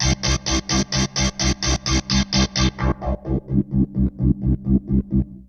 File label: Yamaha CS1x filtered riffs